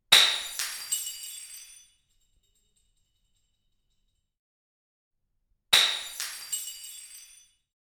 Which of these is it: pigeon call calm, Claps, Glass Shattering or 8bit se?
Glass Shattering